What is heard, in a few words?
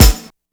WHEEZE TAM; E-MU Formula 4000 Series Vol. 1; Hip Hop Nation; Hip Hop Kit 2